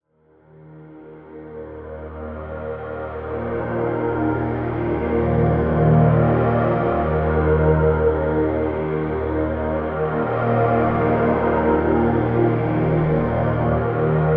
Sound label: Pad D#; One-Shots; Pads; Ghosthack; Color Bass Essentials